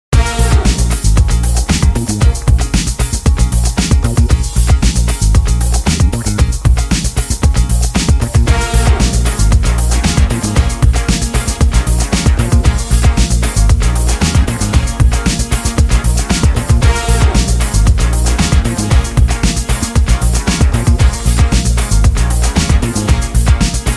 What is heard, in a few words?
Disco Funk